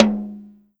Tom High

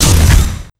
Scifi Lazer

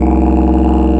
Didgeridoo; qubodup; pitchglitch

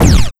Sound FX Scifi Drum Kick

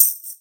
Tambourine

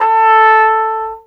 Trumpet; pitchglitch samples; Emirdemirel

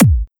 Kick

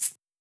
shaker; foxdot default

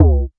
Kick Synth Punch

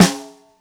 Snare Soft

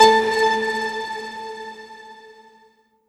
Syn Mari

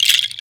Perc Wooden Shaker; Mirko Meter 84

